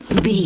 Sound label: lever turn push crank handle small